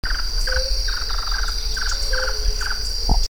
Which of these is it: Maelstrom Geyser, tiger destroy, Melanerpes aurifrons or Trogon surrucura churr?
Trogon surrucura churr